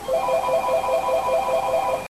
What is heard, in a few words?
Phone rings